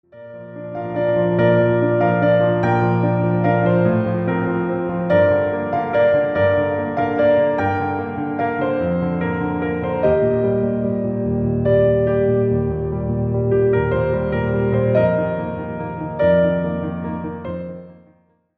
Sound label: Piano Cover